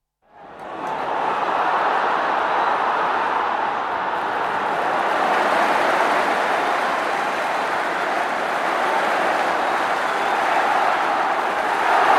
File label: FA Cup Final, roar, applause, big roar, applause; 1989 FA Cup Final, Liverpool v Everton; Crowd; BBC